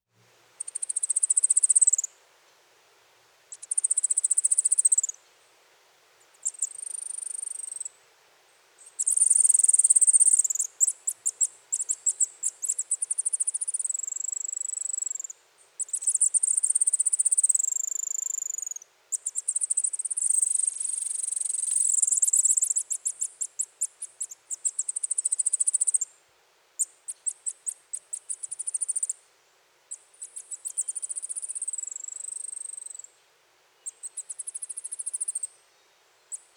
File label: Trill song, call, Birds of the Costa Rican Cloud Forest